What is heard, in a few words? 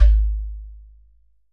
GHATAM